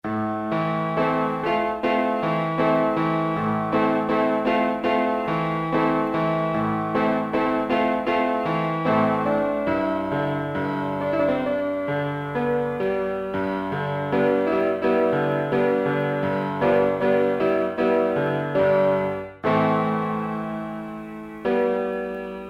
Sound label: piano